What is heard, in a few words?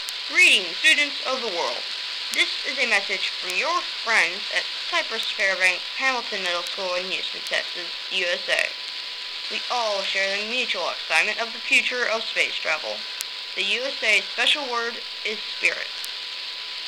arissat usa